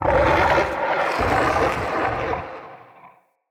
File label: Corrupted Slug Sub Boss Vocal Attack; Creatures